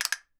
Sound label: CAST; Miroslav Vitous - Percussion & Harp